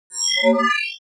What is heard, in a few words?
sci-fi confirmation